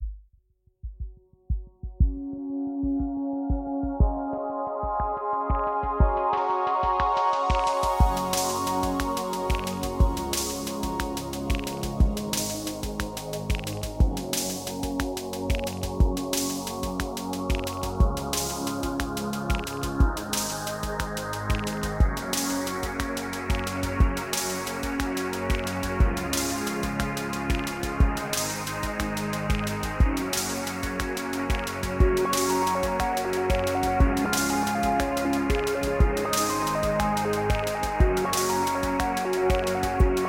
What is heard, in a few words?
beat box; samplescience; dr beat box v1 0